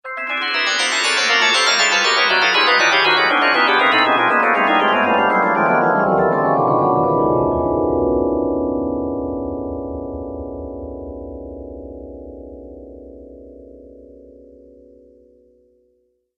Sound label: Haunted Chimes, Scary Tone Sound Effects; horror